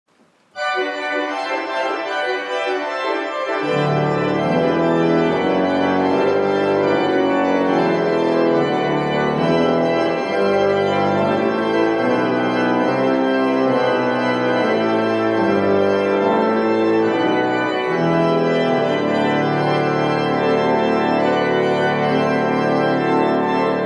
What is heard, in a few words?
gospel improvisation on veni sancte spiritus